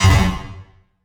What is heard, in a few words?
Retro Impact Metal